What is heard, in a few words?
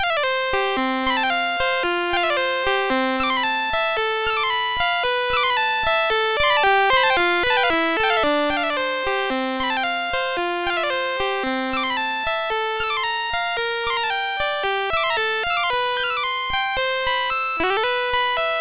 MOS6581; MOS6581R2